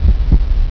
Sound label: heartbeat